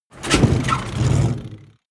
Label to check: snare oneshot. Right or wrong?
Wrong